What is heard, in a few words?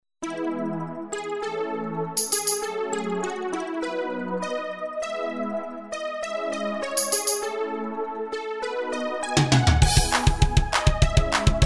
melody